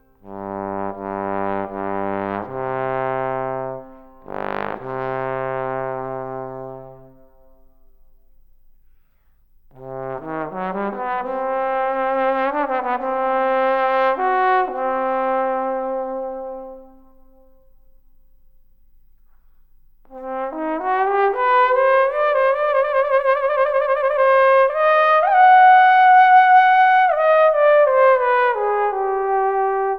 Concerto For Trombone and Orchestra on Albanian Folk Melodies - Cadenza; Albanian Rhapsody